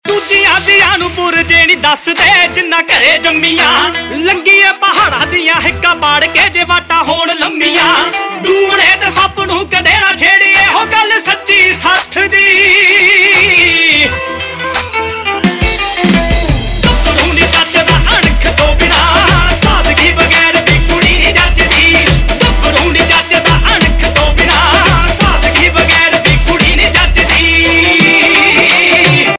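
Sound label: Ringtone, Punjabi Pop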